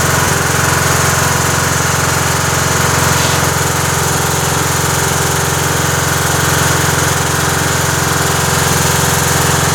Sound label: Engine Loop, Generators